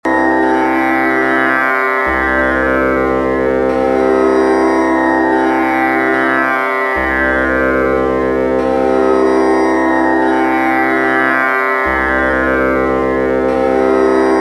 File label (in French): Sonnerie tampura matin